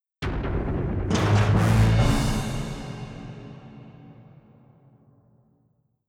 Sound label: Fight Sting; Seekerpede